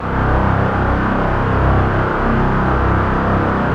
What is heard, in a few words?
SPACE STRINGS, Yamaha VP-1; Music Store